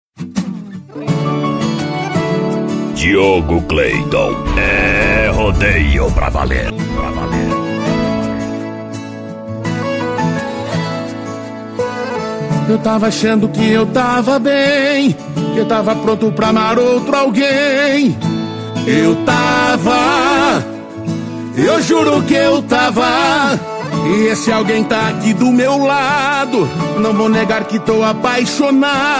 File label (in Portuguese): sertanejo com locutores de rodeio